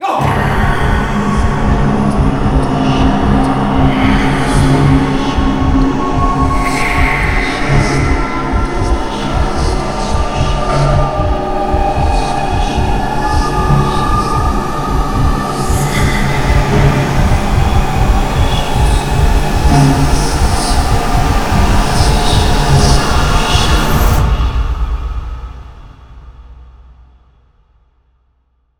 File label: Ending Score; golgotha